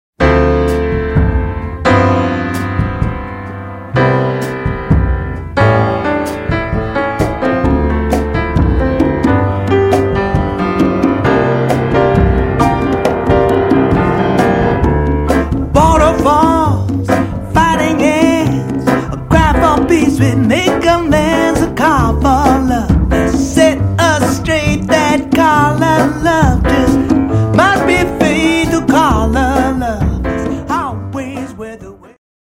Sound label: call